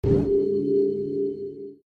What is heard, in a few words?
boss shield; Adventure Time - Break The Worm; ThrillGames